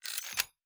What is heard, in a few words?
Weapon Reload; Sci-Fi Sounds